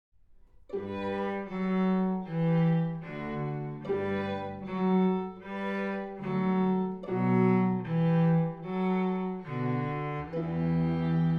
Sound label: with cello